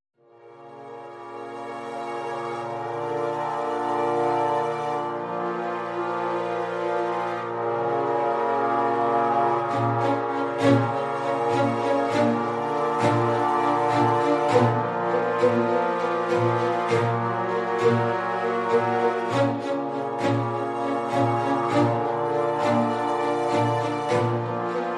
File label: Orchestral Elements